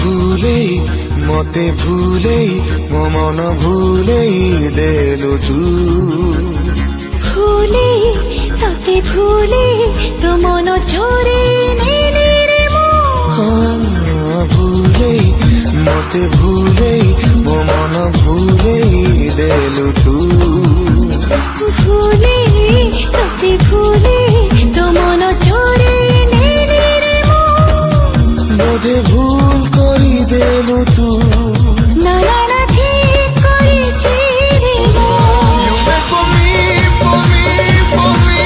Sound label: New Odia Ringtone Song Ringtone